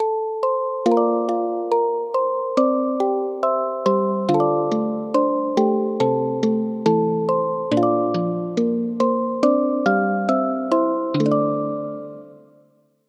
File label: Bell